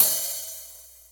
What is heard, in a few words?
open hh, hihats